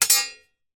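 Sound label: warfare sword hit another fight; zapsplat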